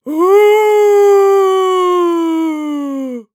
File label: Horror Scary Ghost Moan Old Man Ghost Moan